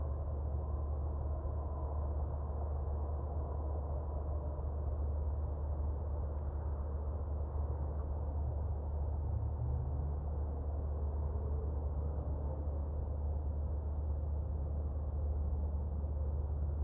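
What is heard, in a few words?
city ext loop, ambient city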